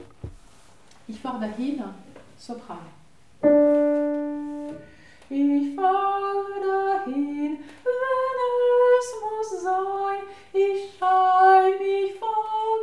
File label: Soprano; Ich fahr dahin